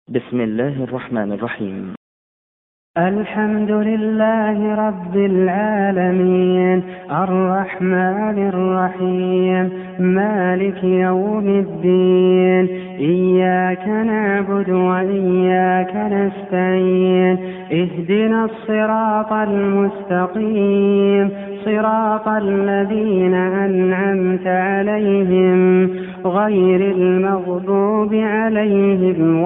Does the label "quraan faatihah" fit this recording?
Yes